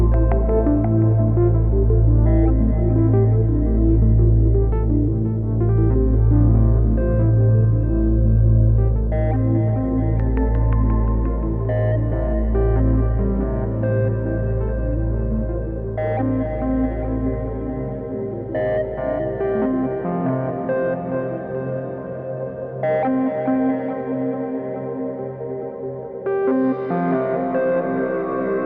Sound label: Huawei P Smart Ringtone